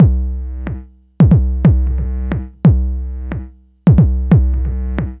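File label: SCUM bass drum, drum loop; Big Fish Audio; Uncivilised G'rooves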